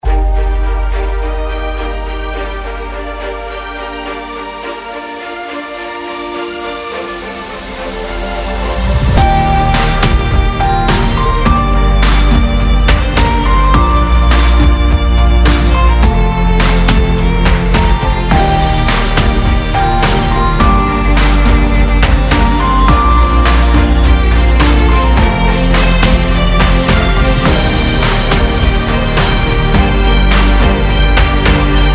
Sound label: Epic Adventure Music Medium